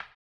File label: HAMBONE, Vocal Blues Soul